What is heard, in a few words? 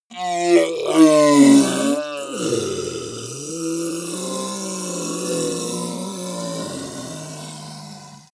ogre dying groan weak slow